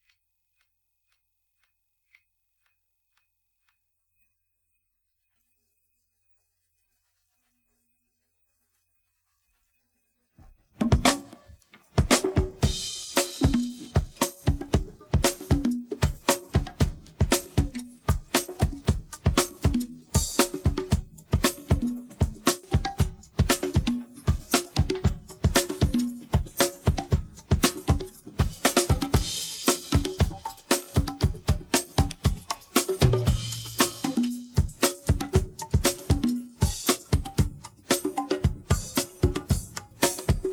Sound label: drum; split by lalalai